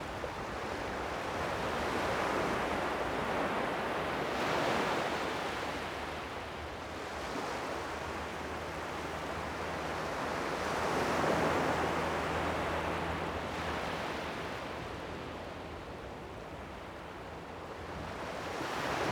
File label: Calm Waves